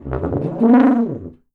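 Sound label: TUBA ELEPHANT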